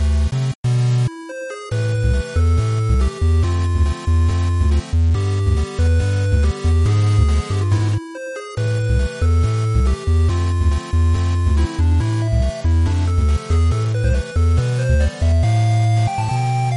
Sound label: 8bit